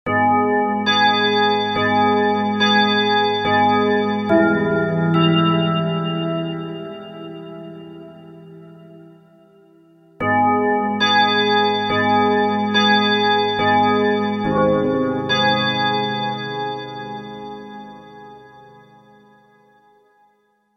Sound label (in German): Kirchenglocken Spiel